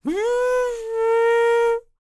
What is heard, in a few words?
HUMAN TRPT, Jazz FX; Spectrasonics; Vocal Planet